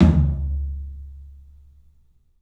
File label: PA TOM, 14 TOM; 3D Sound; 3D Drums; CLUB 14 TOM